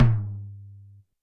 Tom Lo-Fi, YAMAHA RM1X

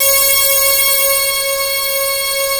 POLYMOOG C5; USB Soundscan vol.49; Analog Fantasies; AKAI

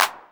909 clap; Best Service; Advanced Media Trax Building Blocks; GHET ROLL

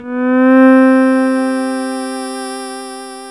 PAD C4; Pasqualina; Best Service Gigapack 1

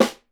SN, Percussion

